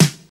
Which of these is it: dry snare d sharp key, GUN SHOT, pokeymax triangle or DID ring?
dry snare d sharp key